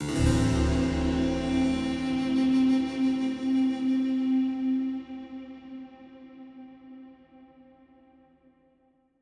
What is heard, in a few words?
Stingers sting neutral